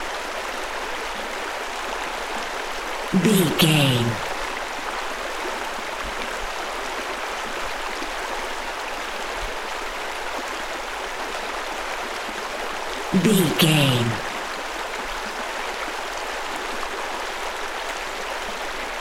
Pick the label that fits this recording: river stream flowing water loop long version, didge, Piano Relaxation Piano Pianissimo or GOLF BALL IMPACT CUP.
river stream flowing water loop long version